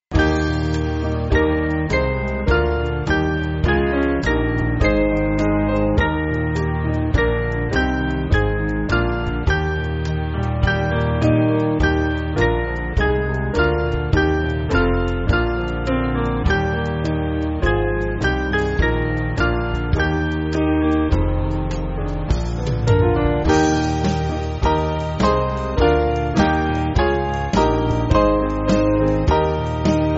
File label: Band, church music; Dundee